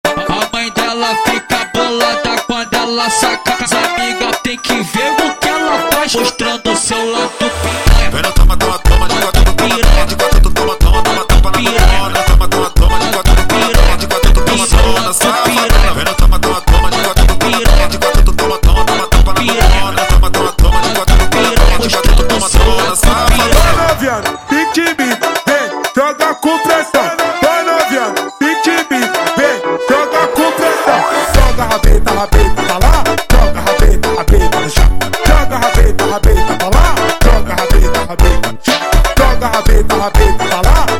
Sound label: Digital Funk slowed